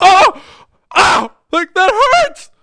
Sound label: get shot; Messiah